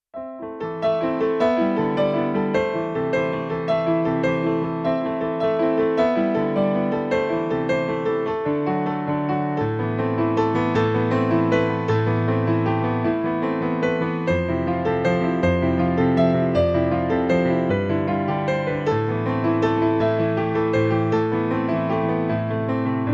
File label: e-piano